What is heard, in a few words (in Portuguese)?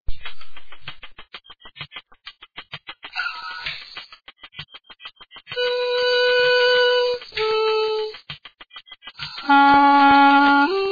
sopro